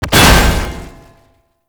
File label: tank swing attack impact; Enemies; Abilities; Lasrian Tank; Warframe